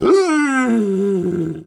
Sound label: wolf die; wesnoth 1.12.2